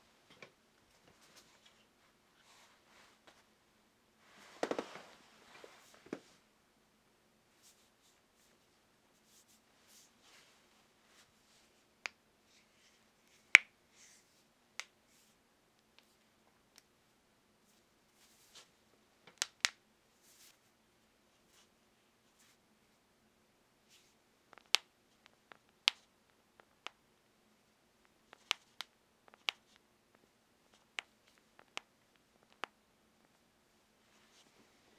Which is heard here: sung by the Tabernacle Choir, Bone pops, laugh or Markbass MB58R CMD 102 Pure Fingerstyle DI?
Bone pops